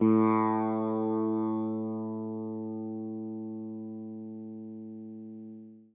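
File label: electric sitar soft; Quantum Leap - Guitar & Bass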